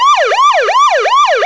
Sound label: vc siren, vehicles